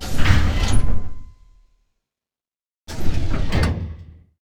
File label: mecha spawn